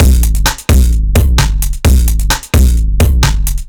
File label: Beat VT Echo 130, distorted drum loops 130bpm; musicradar